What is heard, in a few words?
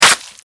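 flesh heavy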